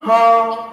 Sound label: huh male, Voice; ooh ahh; heh huh